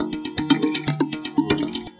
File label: Marimba; Marimba Lumina; Sonic Foundry (Sony Creative Software); The Electronic Music Manuscript Collection; Drum Loop Structures